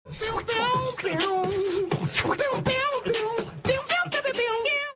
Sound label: beatboxing the theme; scrubs; jd and turk